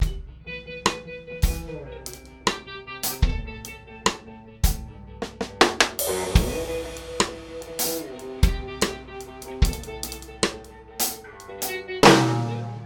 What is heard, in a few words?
loop